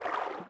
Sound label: footsteps water